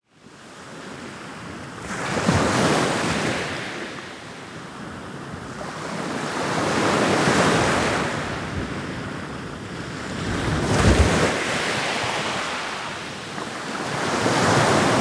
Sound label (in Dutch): oceaan